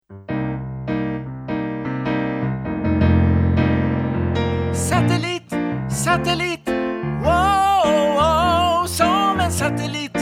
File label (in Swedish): tenor